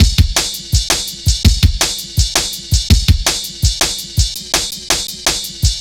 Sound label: Drumloops, 165bpm